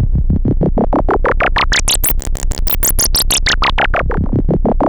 MOOG C2, Synthesizer; Hollywood Edge; Giorgio Moroder Rare Synthesizer Collection; MLTI.MOOG

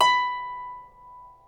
B4 CELTIC, HARP

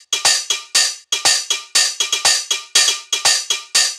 Combo Hat; Sonic Foundry (Sony Creative Software); Trance NRG VOL-2; Drums; Combo Hats

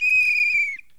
BIRD, bird call; Greytsounds VOL-1; BIRD CALL FX

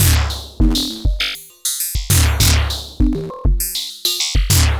Ratchet Beat; Sonic Foundry (Sony Creative Software); Ma Ja Le Ethereal Textures; Beats

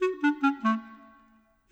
Clarinet; Sonic Foundry (Sony Creative Software); Orchestral VOL-2; Modern 13

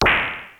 FM SYN PERC; Crypton - DrumBase5000

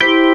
tuners

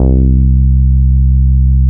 NORD MF C3, NORDY BS

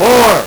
LSDj, Nintendo Gameboy; 300 Drum Machines

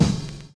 OLD SNARE; Univers Sons

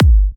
G, kicks; markus hakala kicks

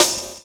170 LOOP; USB Soundscan vol.05 - Explosive Jungle; AKAI

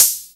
HH hh; Best Service Gigapack 2

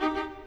Violas, Orchestral

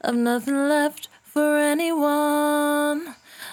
68bpm D, Velvet Vocal; Zero-G

